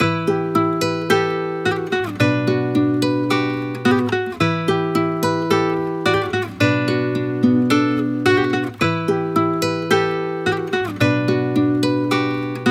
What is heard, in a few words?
109 BPM E Min, Guitar Loops; Dead Eye; Cymatics; Journey Guitar Loops